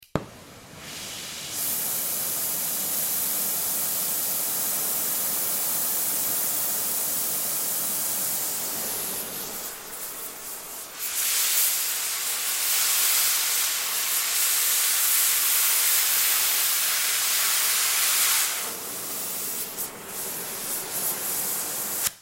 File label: Blow Torch On Off, Blow Torch On Run Off Flame Gas; Welders; INDUSTRY; Blastwave